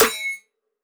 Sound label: Snare; TRUTH-HZ; Crowdsourced Sample Pack; Drum Kit; Claps & Snares; Screecj